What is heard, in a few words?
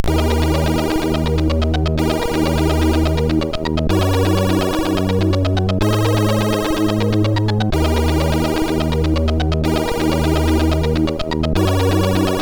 intro music